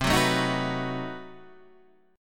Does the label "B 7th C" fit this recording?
Yes